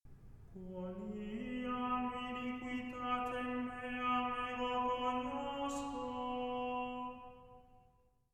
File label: Gregorian Chant; Miserere; Psalm 50; Quoniam iniquitatem meam eg